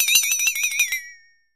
Twang Funny Metal; cartoon fx

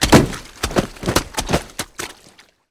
Body Falls Mud Heavy

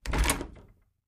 Attic Closet Door Clo

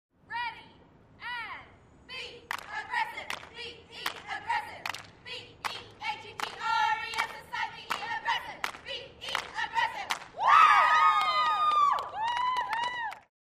Cheerleaders Cheer

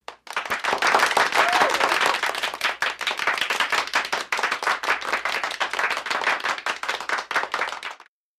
10 Women Clap Little O

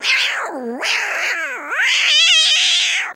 Cat Angry Squeal Figh

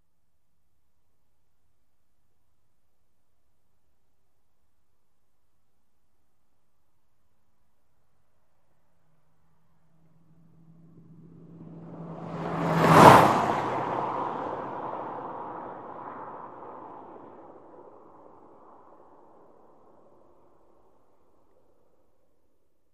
Sedan By Rt Lf 100mph

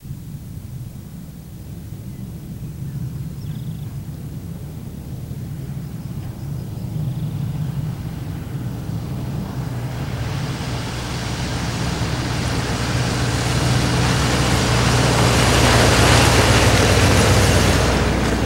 Ford Bronco Slw Pass B

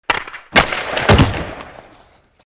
Hockey Puck Hit Slam

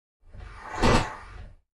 Space Door Close Sli, doors high tech

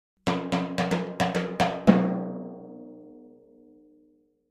Timpani Hands Thin, mallets timpani

